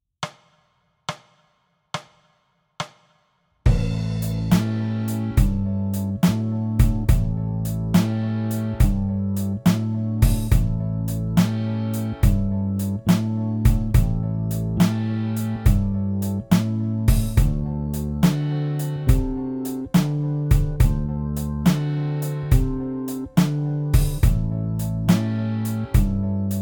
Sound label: Blues In A